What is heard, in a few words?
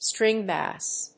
String bass